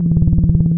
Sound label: cbot fly loop, scanner; npc; Half Life Alyx